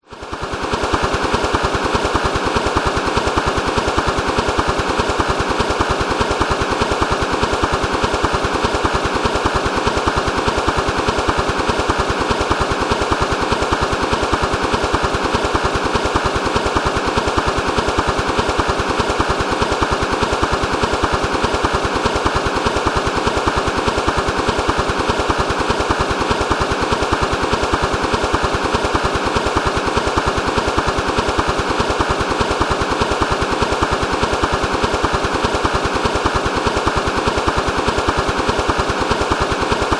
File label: household fan ceiling spin full speed close up